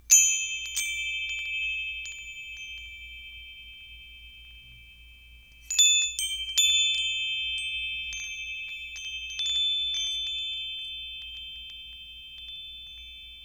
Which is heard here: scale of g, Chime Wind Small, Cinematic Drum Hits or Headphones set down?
Chime Wind Small